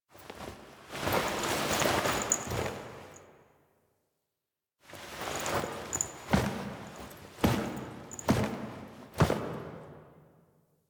Foley B; Drifter; Warframe; Quests; The New War; Lotus Reveal

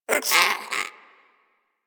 Rodent Vocal Tranq Dart Grunt; Warframe; Hunting Critters

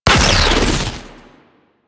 Burst Laser Shot; Warframe; Teralyst; Ropalolyst; Abilities

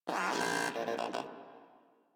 Moa aggressive vocal greeting; Warframe

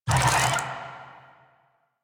Infested Big Ashen Vocal Pain; Warframe

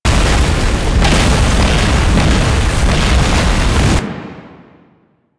Void Dragon Firebreath Beam End Loop; Enemies; Abilities; Warframe; Duviri

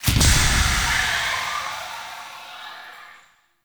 Balloon Destroy; Encounters

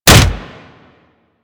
Kick Hit Default; Melee

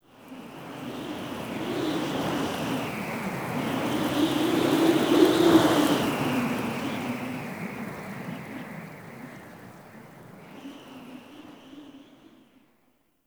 Under City Wind Gust Strong Whistle Rope

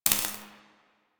Laser Skin Printer Laser Stop; Warframe; Ambience; Entrati

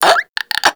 Infested Crawler Breath; Warframe

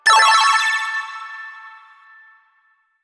Snake Game Success; Warframe; Nineteeen Ninety Nine

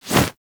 Arm Swing Foley Heavy; Enemies; Warframe; Purgatory Warrior

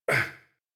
Pain, en; Warframe; New War; Teshin

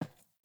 Grunt Boot Walk Metal; Characters; Enemy; Melee Grunt; Footsteps; Boots; Sinecure

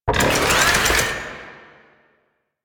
Melee Slam Charge; Warframe; Necramech

